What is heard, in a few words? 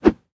swish; flip the water